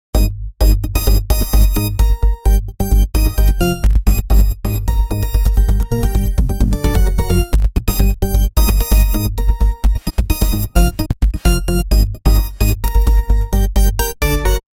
bouncing ball repeating

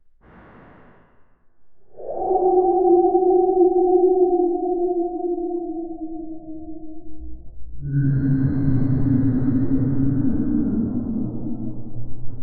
creepy monster futuristic

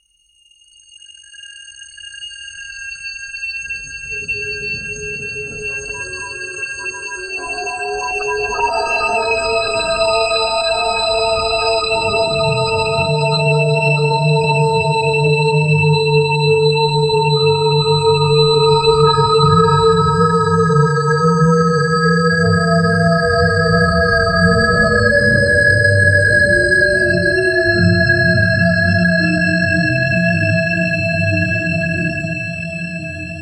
anime transformation soun; anime transformation sound

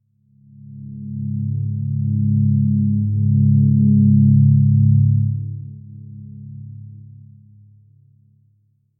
deep hum builds to a; atmosphere

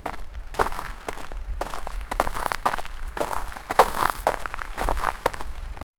footsteps

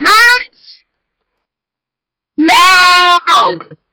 smooth xylophone

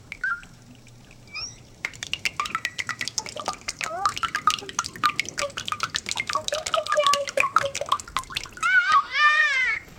running water fountain lo; comedy

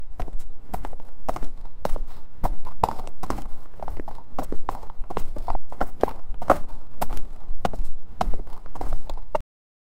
generate heavy kaiju walk, earth

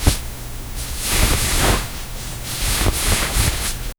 realistic dash, fighting game

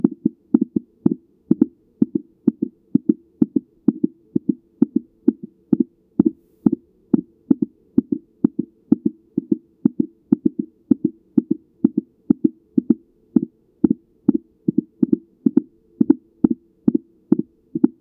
quiet hospital background; baby

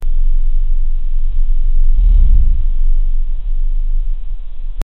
old interphone sound

